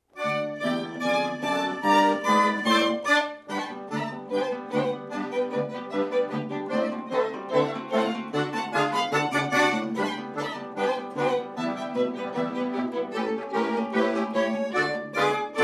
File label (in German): Polka